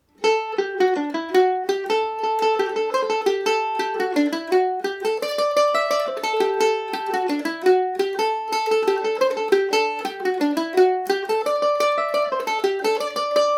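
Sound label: A dorian, Irish mandolin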